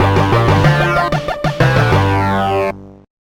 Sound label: level start; Edd the Duck; Amiga